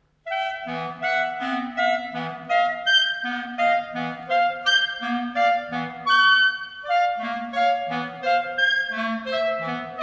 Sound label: lullaby